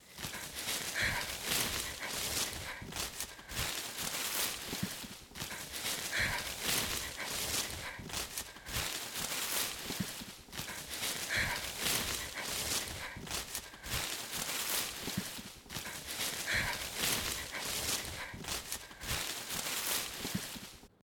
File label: Running In The Woods